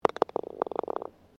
GOLF BALL IMPACT CUP; Golf Sound Effects; Various Golf sounds; Golf (Sound Effects:Sports)

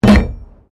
PLASTIC BARRICADE HIT; Material components for constructing more complex sounds; Materials

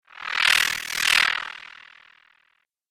Fast Double Ripple Zoom, whoosh; Whoosh Sound Effects

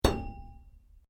METAL PIPE SMALL STRIKE; Metal (Sound Effects:Materials)

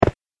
Snow Foot Step; human; Footstep Sound Effects

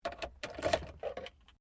CAR SUBARU LIBERTY INTERIOR KEYS INTO IGNITION

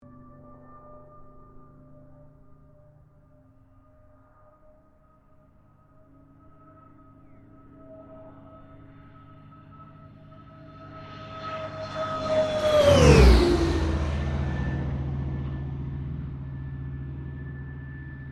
AIRCRAFT CA-13 BOOMERANG PASS STEREO

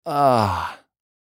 Human Moan; Moan Element Male 25 Yrs; Pain; Sound Effects:Voice

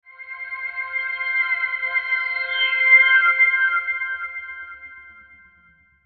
Logo Tonal Swell Flange; Sonic Logo; Sonic Logo Sound Effects; Production Element Imaging Element Accent Transition

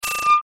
Rollover Beep Tone Multimedia; Web Interactive; Technology; Beeps; Blastwave

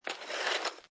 Snow And Ice Ice Skate Slide; Weather

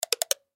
Button Switch Gear Multimedia; Web Interactive

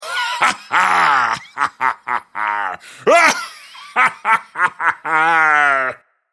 Male crazy, villainous laughter; Voice

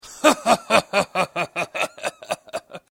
Laugh Male Laughter Laughing Male; human sound effects

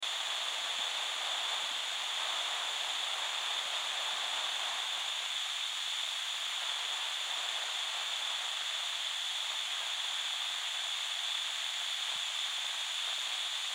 Cb Radio Static; Long Loop; Technology; Miscellaneous Electronic; Blastwave

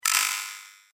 A Thin Vibrating Decay; Miscellaneous Cartoon; Andrew Fleming

